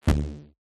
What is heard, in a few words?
Burst TV Power On Synth Electric